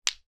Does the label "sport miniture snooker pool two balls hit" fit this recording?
Yes